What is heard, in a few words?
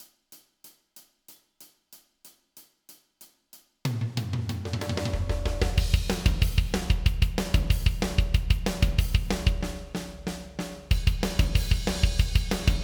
drumtest